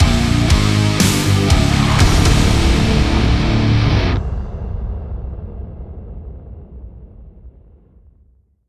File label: Wave Complete (Inst); Killing Floor 2